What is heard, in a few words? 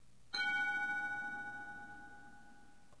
SF Tram Bell; usa